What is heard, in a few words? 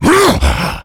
rage, infected, male